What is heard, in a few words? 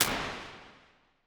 Plates Modern Dark Plate R; Impulse Responses; Ableton Live 12 Lite